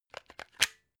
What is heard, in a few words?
plug unplug clatter